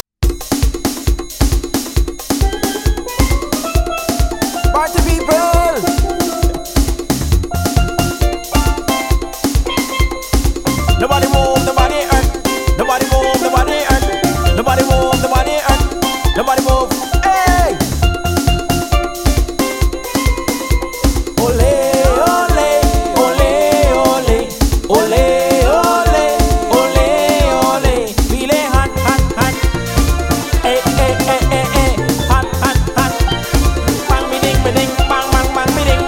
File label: Steeldrums with Vocals